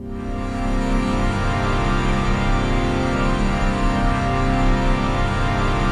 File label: ATMOPAD; Best Service; XX Large; Pads